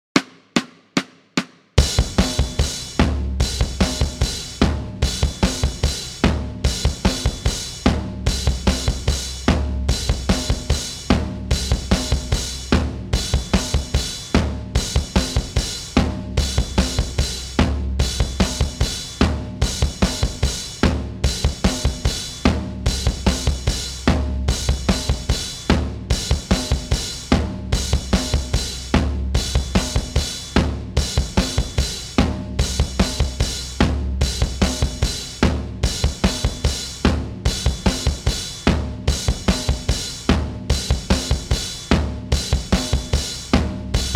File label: 148bpm